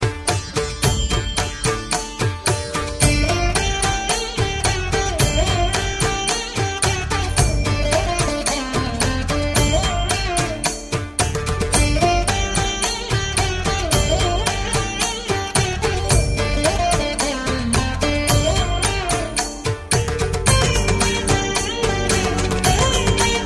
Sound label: arabic dance tone